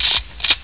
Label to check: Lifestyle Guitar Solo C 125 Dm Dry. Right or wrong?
Wrong